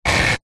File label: stone small scrape on concrete paving slab; foley